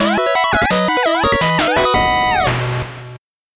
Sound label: 8bit jingle slow